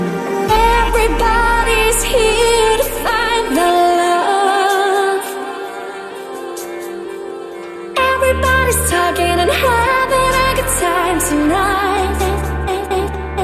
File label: Ringtone